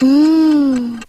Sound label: mmm